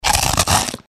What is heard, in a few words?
eating chew crisp fat